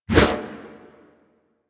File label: Sword Swipe, Whooshes; Weapons; Melee; Warframe; Pacifist Sword And Board